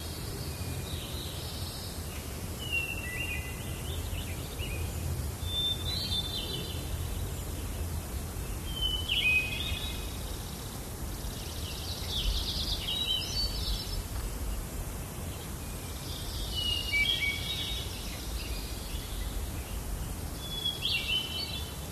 Woods Quiet Amb Birds

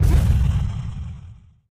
SHOCK RIFLE BALL IMPACT; rifles

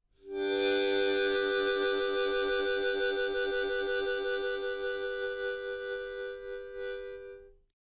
Mouth Organ Vibrato, harmonica; woodwinds

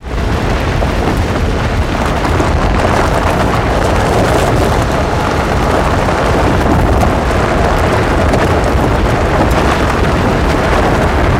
Car Tires On Gravel, Very Boomy, Not Crunchy

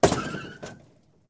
Auto Crash Med Head O, car crashes

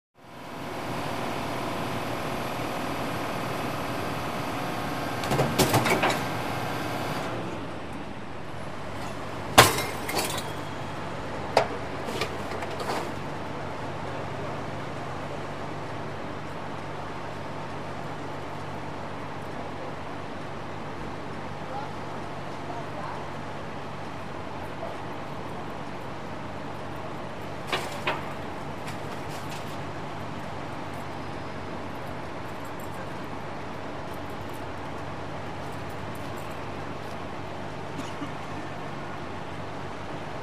Garbage Truck Close

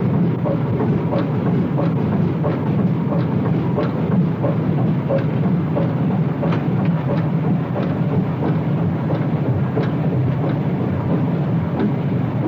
Boat Engine, Steady Hum and Rock

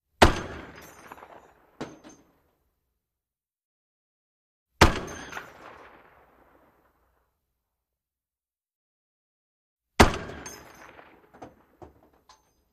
.50 Caliber: Single Shots With Shells Dropping

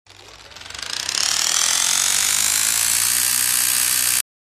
Broken Servo Power, servos

